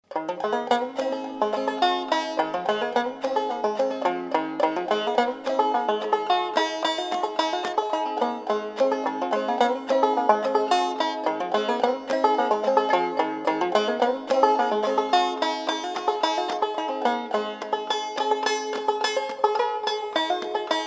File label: Sandy River Belle G Tuning, banjo